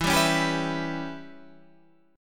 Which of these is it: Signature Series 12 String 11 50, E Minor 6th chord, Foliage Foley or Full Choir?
E Minor 6th chord